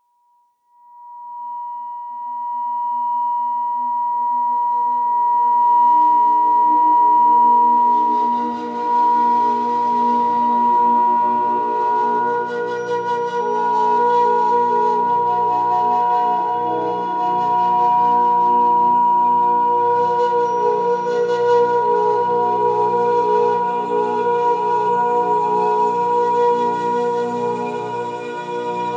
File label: 963 Hz